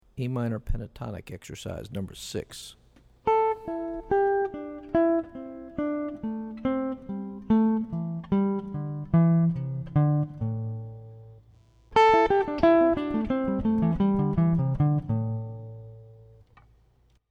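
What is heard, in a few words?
Am Pentatonic Exercise, guitar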